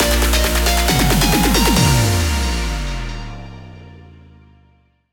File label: League Up; Pokémon Trading Card Game Live